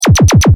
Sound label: Laser loop, Laser: Firing; Lasers